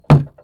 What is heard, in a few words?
Car Door Hit